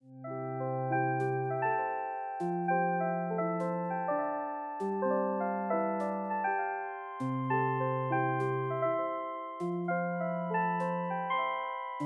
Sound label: bgm